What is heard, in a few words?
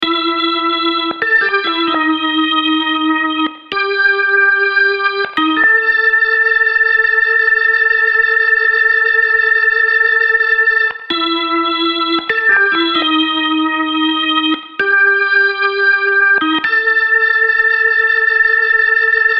rooms, solo, B3 Leslie